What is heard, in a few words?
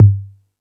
Beat Box, E-MU Pro-Cussion; drum machine